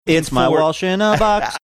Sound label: singing something